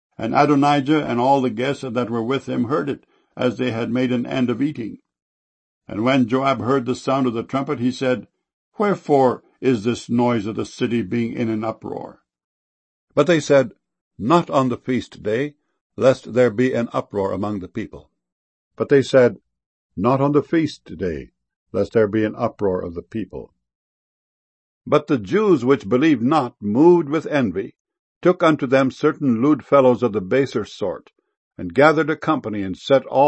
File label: uproar